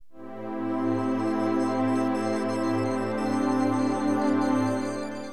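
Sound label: shimmer, keyboards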